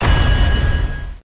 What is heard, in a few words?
Heavy Slam; pokemon reborn e19 2022